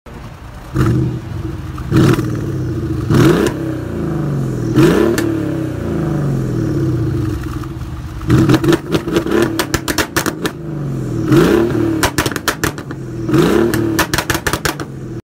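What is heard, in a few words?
Benz cls500, exhaust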